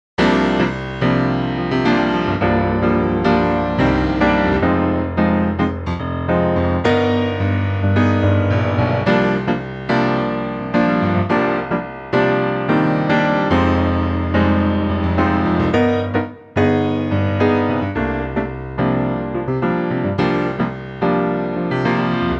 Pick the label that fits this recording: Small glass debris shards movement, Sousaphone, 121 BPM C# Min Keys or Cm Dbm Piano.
Cm Dbm Piano